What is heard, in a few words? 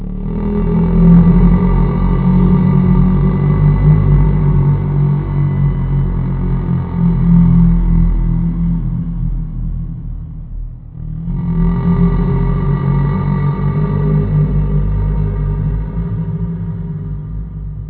Moaning Pitch Bent Reverb